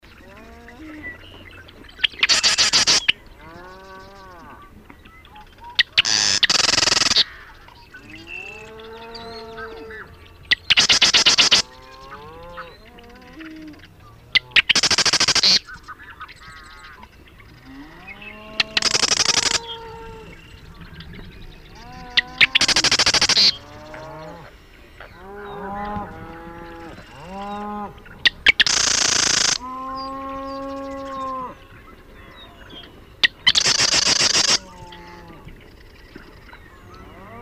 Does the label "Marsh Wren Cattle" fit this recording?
Yes